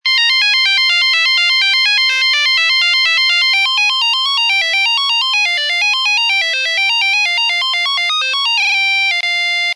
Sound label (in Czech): Melodie Nokia 5110 Fuga